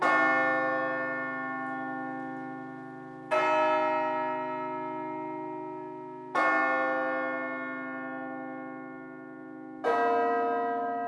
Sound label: prtch bell